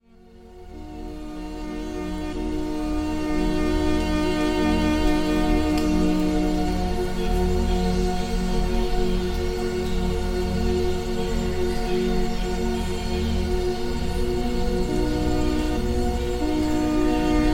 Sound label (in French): Reiki ambiance zen, Harmonie paisible; hotusa spa